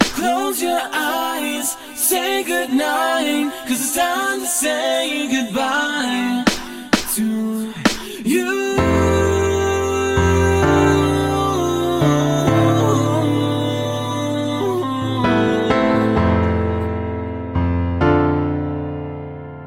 Goodbye Club Music

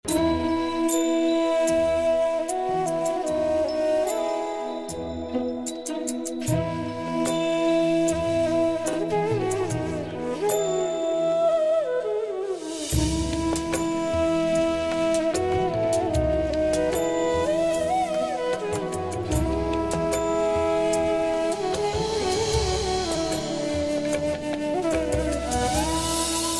Soft BGM; Zee TV; Jodha Akbar; Jodha In AGRA

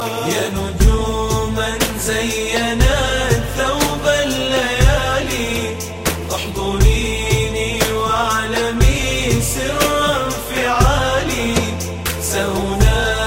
Islamic Song; Islamic Ringtones

